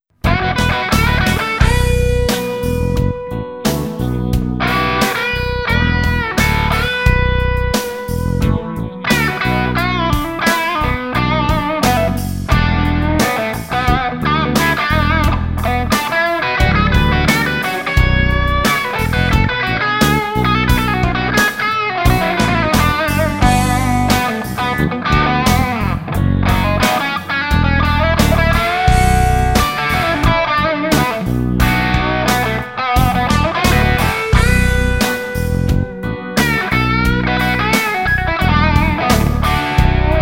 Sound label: LP blues